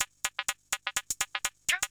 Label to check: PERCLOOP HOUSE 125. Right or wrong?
Right